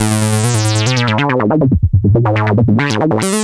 ACID LINE; ACID LN

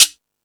Timbale, E-MU Pro-Cussion

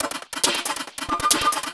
percussion loops, Techno; Techno ID

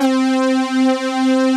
SYN BRASS; Pro-Rec - Synthsonic

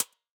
hats C, hip-hop drum kit; 99Sounds x Monosounds; Moon

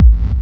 hard bass drumz

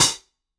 Solid Bruiser C24, Dimensional Hats; Airwindows

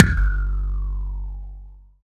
bass drum; Zero-G - Chemical Beats; BASSDRUM ZOO

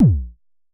Unce Unce Kick A#1, Vermona Kick Lancet; LookIMadeAThing Kicks

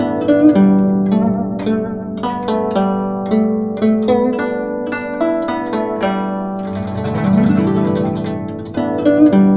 GUCHIN 110 G; INIS - Opium

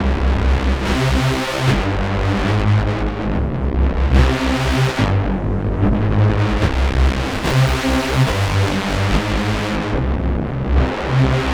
Darker Rave, Drum n Bass, Loops, Pads; KJ Sawka; Drum n Bass & Beyond

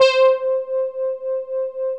SQ80 FRT, synbrass; Synthesizers

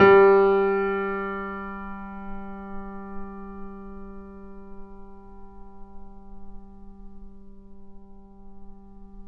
Mp G 0 off, Grand Piano off mic

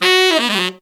TEN F# SF, Phantom Horns TENOR FX; Zero-G

